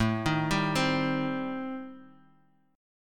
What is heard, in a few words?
A Minor 7th Flat 5th, Guitar Open D